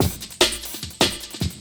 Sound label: LOOP, 150 BPM, Drum & Bass